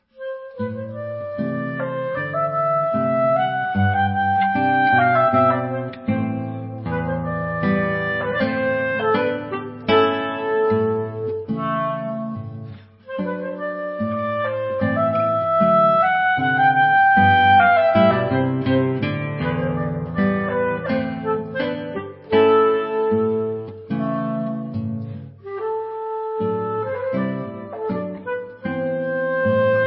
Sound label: ROMANIAN FOLK DANCES SUITE; per clarinetto e chitarra